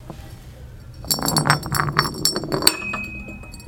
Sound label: Bottle rolling Stop